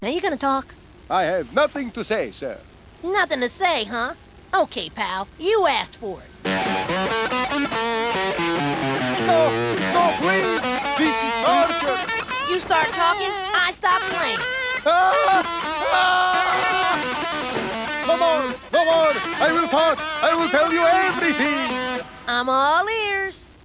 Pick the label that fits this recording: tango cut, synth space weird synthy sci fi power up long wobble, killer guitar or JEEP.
killer guitar